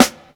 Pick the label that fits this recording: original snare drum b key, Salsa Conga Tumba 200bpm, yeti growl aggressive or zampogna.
original snare drum b key